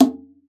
Conga, Session Instruments

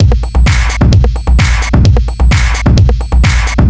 4 floor beat, trance

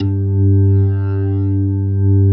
G2, PERCORG; Sonic Reality; Vintage Timetraveler

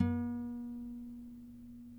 NYL NV C, nylon no vib guitars

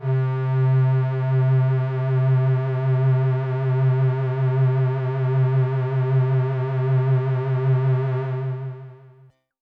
Pad C, Synth Prophet One Shots; Electronisounds

